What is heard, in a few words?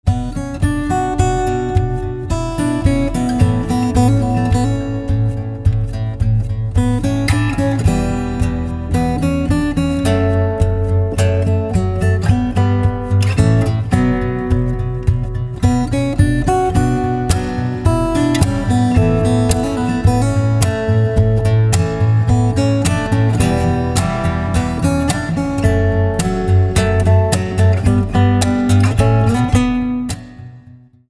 Guitar, instrumental ringtones